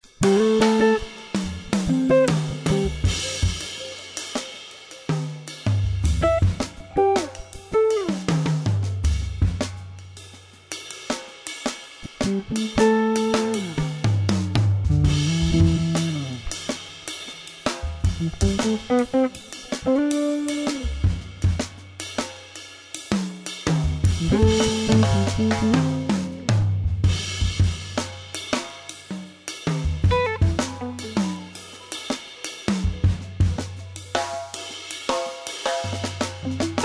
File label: Jazz Guitar; Ueberschall Jazz Guitar